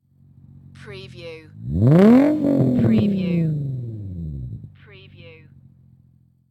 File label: INFINITY G35 EXHAUST SINGLE REV